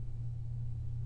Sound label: amb indoor; KINGPIN